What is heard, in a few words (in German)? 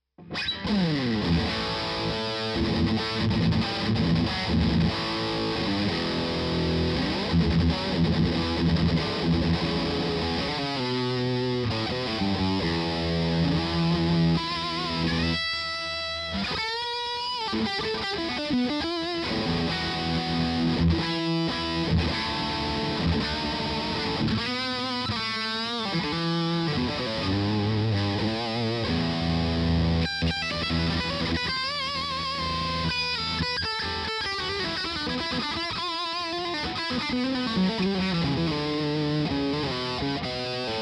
Steg PU High Gain